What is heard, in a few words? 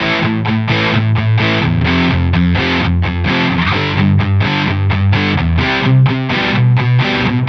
Distorted Guitar 140BPM Amin; Distorted Guitars; Vengeance - Essential Clubsounds Vol.4; Guitarloops